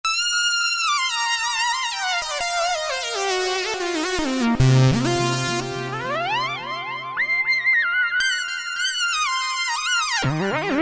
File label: Roland JP-8000 Soaring Mini; Factory Patches